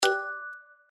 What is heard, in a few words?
Bonus Lucky Pick Pick; slots; Outdoor America